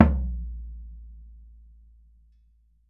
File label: BOMBO, Brazil Drum Perc; E-MU Producer Series Vol. 7 – Old World Instruments